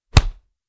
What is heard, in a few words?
Fist Punch No Vocal; noise creations